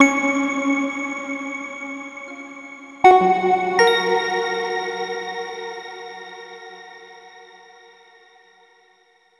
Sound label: Tone